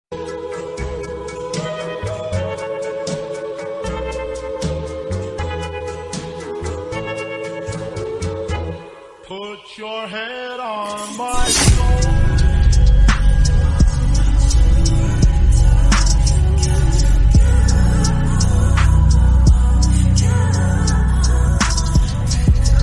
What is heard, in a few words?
korean song